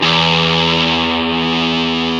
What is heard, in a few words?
GTR Fuzz, Dirty; Club-50 - Foundations Roland